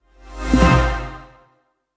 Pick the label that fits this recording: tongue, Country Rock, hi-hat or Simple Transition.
Simple Transition